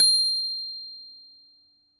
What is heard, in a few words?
Glittering Clink